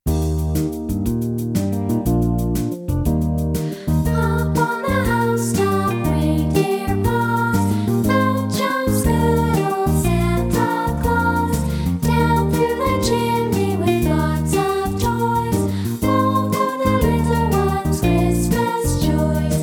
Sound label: Up on the Housetop vocal; Christmas Secular